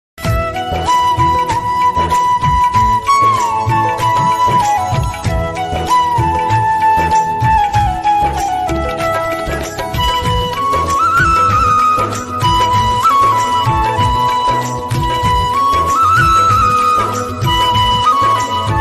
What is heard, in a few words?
Jesus ringtones in telugu, Telugu Christian Songs